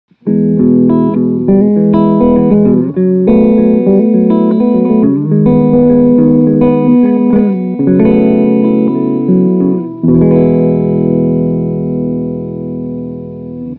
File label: Fattest